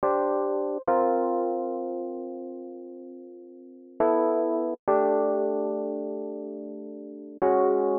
120 rhodes chords C, Keys, Nu Jazz House; PowerFX

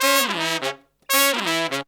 horns, 160 bpm; 90 s Sample CDs; Zero-G; Phantom Horns

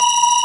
B3 C 5, ORGAN; VOCAL ORGAN; ORGAN #4

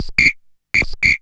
BIRDY 160, Hard & Loud Techno

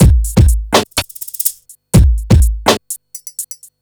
BEAT, Hip-Hop & R&B; USB Soundscan vol.47; AKAI

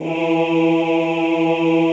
OOH E2, Classical Choir; Best Service; Extended Classical Choir

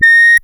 COMPUTA TONE; Zero-G Ambient 1; COMPUTACHATA

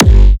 Instrument Layer, kick

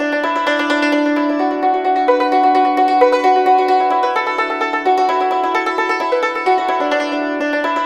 YNCHIN 90 D; INIS - Opium

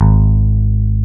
G#2, E-Basses, Roland

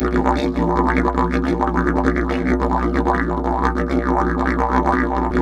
B BURBLE, Didgeridoo; Big Fish Audio - Didgeridoo and Other Primitive Instruments; BURBLDRONE

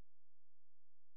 C#, Synclav FM, FX; Big Fish Audio

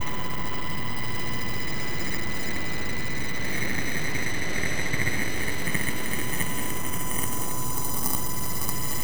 Drone Ambient; Drums & Drones

